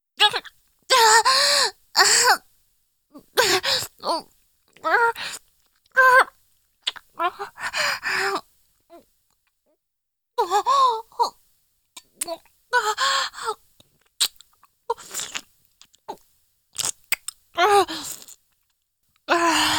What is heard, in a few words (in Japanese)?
舐め音